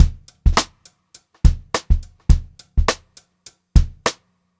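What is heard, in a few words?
104 straight variation, Funk Groove Drumloops; USB Soundscan vol.38; AKAI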